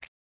button Out; hotline

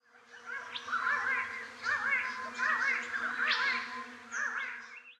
bird; temple of nudges

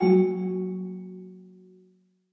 melody Gb3; fairymirror

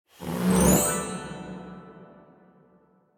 sym Anim Harp; jack and beanstalk

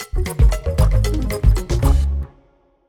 music free spin intro outro; reef raider

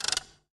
squeak spin button down; jingle spin

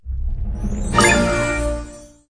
candy Win Reveal Medium; fairyhansel

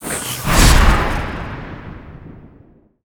Symbol Free Spin Triggered; godsoffortune

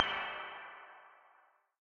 count Up Loop End; wolfcub

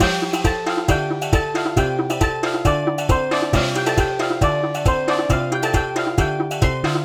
ambience free spin; happy riches

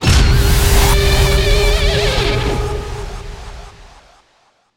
scatter Win

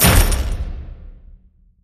max Bet Button Click; jumanji

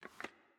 bricks indent; cashomatic

